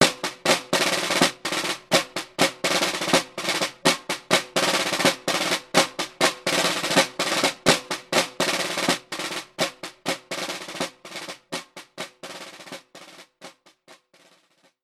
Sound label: Cannon Drums